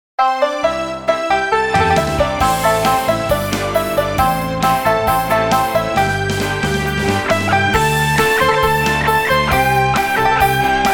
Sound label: China Rock